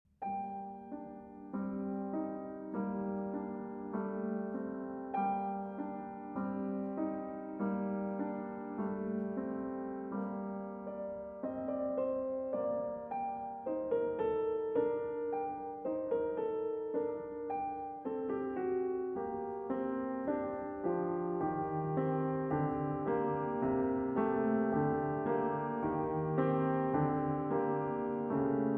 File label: Prelude in G Minor; Prelude & Fugue in G Minor, BWV 861